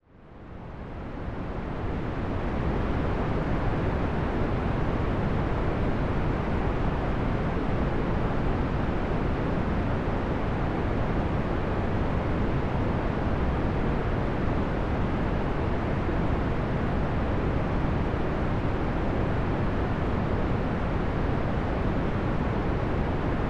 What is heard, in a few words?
gentle rain; the sleepy owl soundscape pack